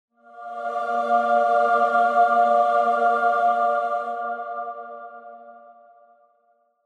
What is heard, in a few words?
Angel Long